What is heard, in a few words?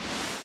spawner respirator pull; Factorio